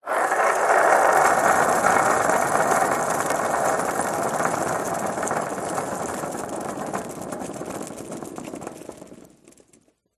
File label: scooter kids wheel roll to stop; vehicles